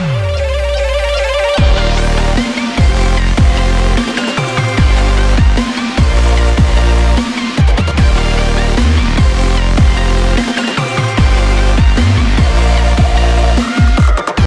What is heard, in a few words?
High Cut Model, High frequencies filtered using modelled filter; Modelling Filters on a DJ Controller